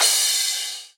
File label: crash